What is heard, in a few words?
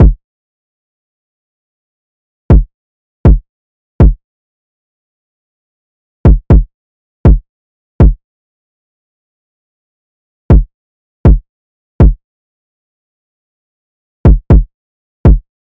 Slow Crunch Drum Loop 120 BPM Kick; Cymatics; Mystery Pack Vol 4